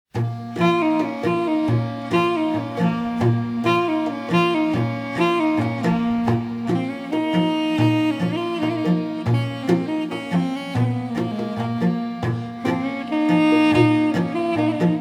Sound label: armenian melody